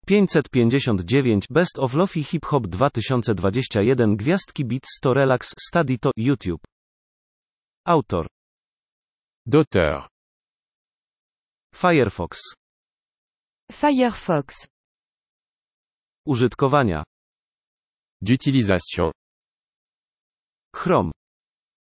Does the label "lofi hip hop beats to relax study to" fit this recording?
Yes